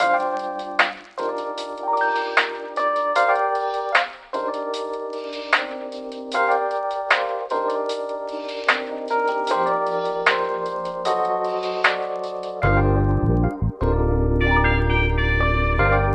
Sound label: Lofi